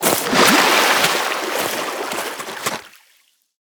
creature baby climb land; snowstalker

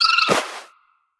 creature baby penguin death land

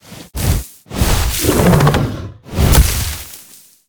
creature pinnacarid sit up

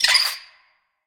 creature baby penguin flinch land

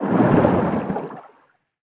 creature arctic ray swim fast

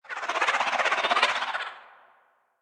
creature arctic ray idle

